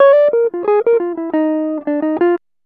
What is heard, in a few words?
ACID JAZZ